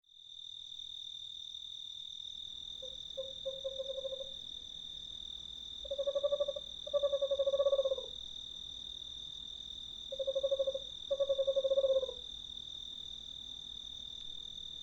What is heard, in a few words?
screech owl